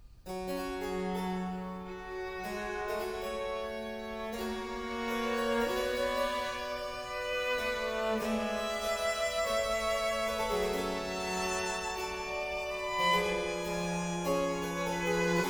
Trio Sonata for 2 violins & continuo in G minor, Preludio